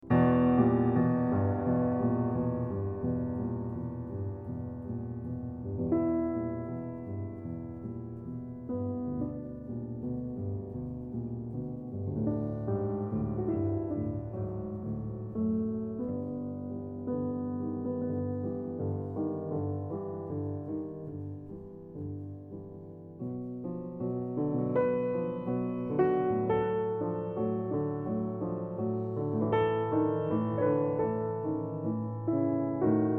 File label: Preludes in A Minor Lento; CHOPIN; 24 Preludes Op. 28 No. 2